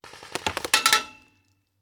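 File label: Paintball Gun Impact; Sound Ideas; Guns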